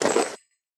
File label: armor light; smite; chiron